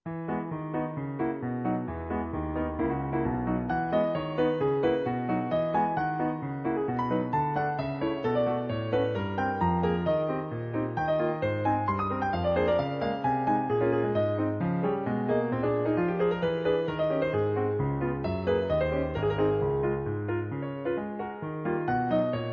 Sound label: Piano folk; Marcia. Allegro marcato